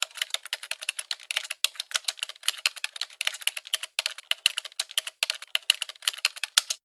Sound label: The Typing